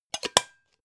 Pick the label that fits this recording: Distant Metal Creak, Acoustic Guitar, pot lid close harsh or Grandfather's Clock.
pot lid close harsh